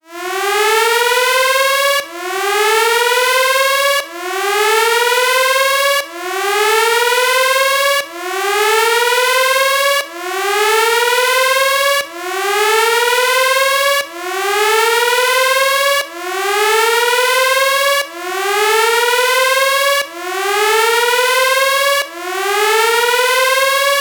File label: emergency alarm siren